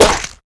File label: spore attack